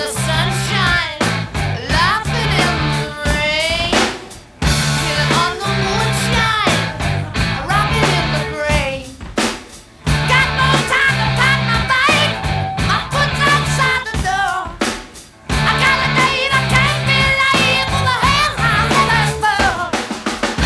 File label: rock